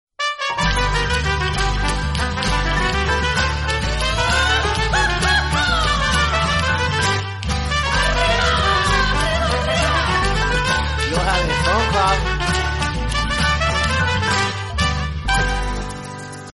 mexican tune ringtone

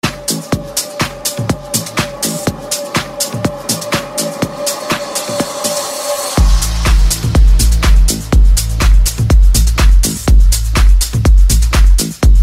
deep electro ringtone

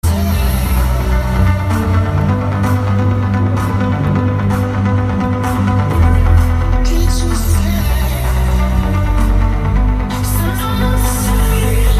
trance ringtone; tomorrowland

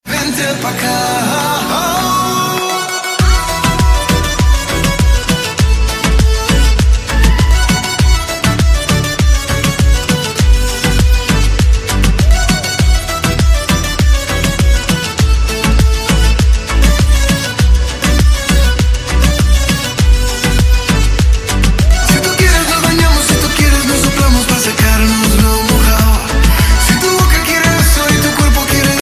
arabic ringtone

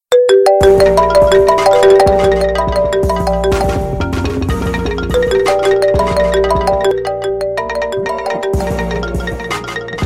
iphone remix ringtone

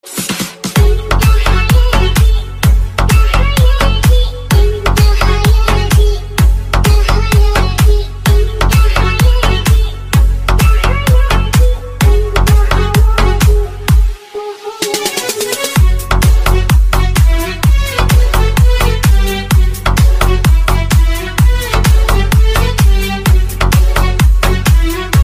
arabic ringtone

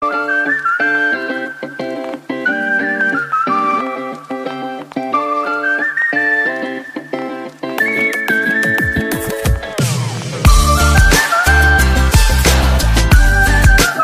apple iphone alarm tone

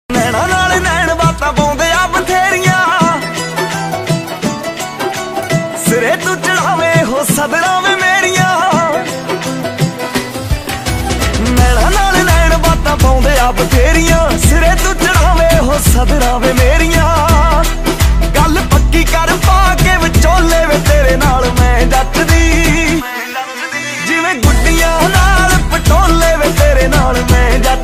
punjabi ringtone

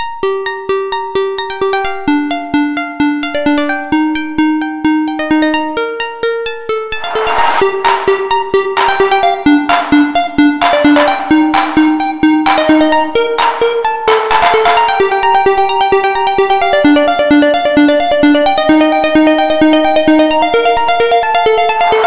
melody electro ringtones

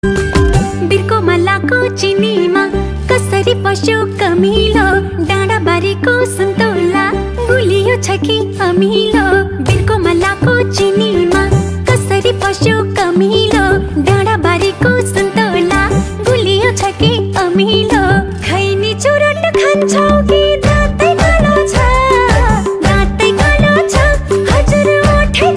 nepali ringtone

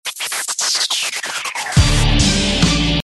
dance ringtone

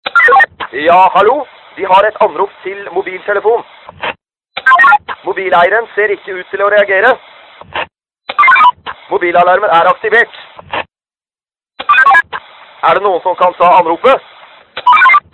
norwegian anrop best tune ringtone

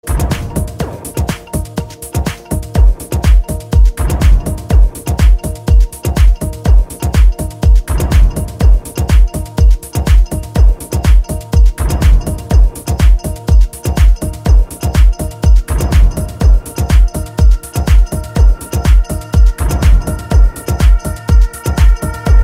nokia 8 ringtone

